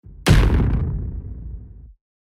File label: Superhero inbound